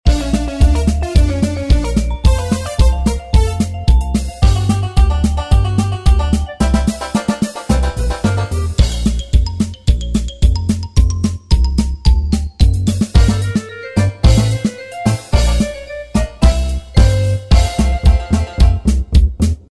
loop

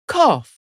cough

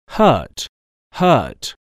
hurt

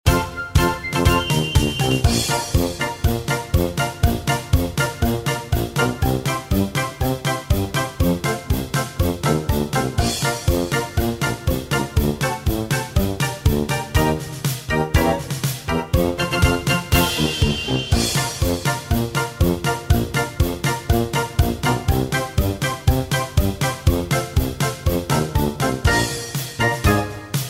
loop

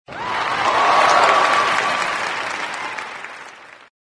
applause

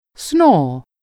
snore

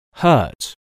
hurt

